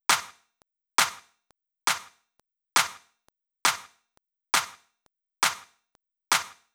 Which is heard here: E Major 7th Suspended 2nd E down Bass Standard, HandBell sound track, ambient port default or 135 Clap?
135 Clap